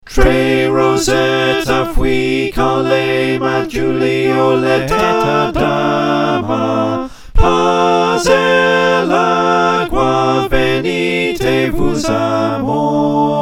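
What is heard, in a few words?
soprano alto tenor bass, choir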